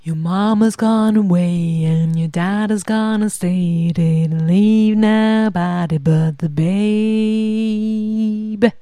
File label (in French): voix basse